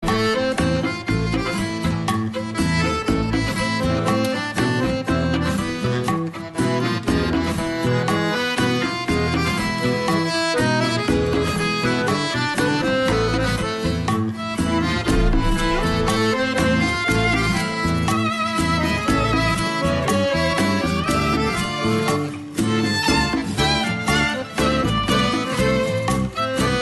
argentinian tango

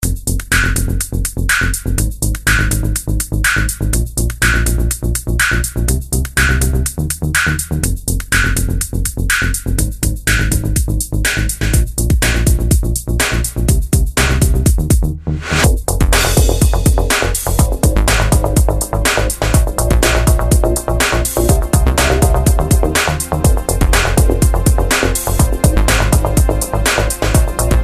Rock N Roll

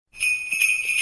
Sleigh Bells

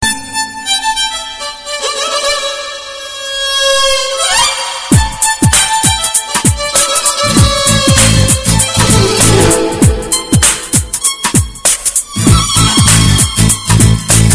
Violin Best Music

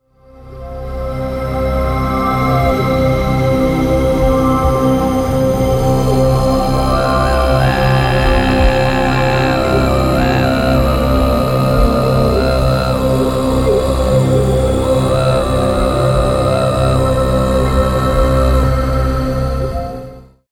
Tibetan Monk

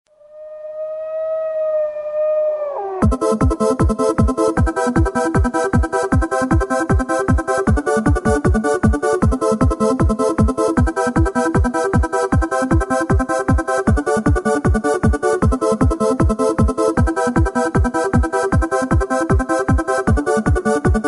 Wolf Mix

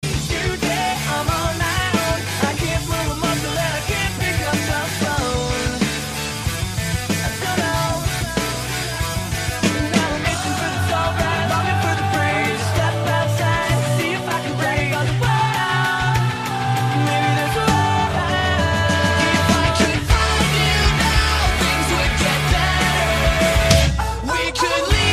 Emo mashup

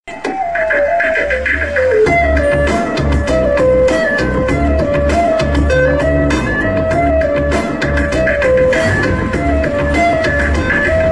Flute Awesome

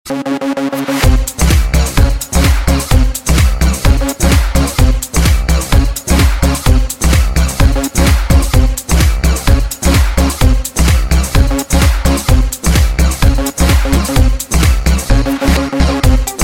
Clubmix

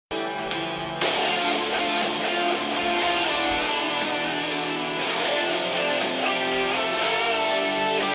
metal

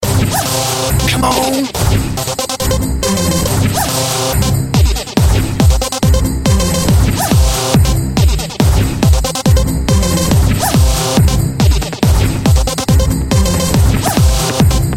Beatmix

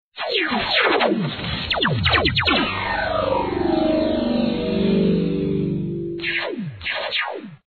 Message Lasermix

